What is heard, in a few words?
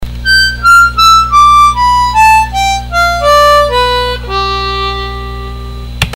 blues down